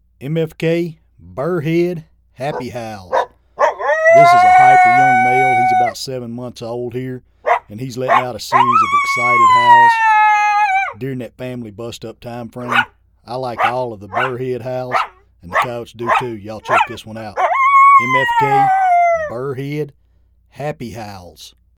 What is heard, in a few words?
Happy Howls, Game Calls; Recorded with the best professional grade audio equipment; BurrHead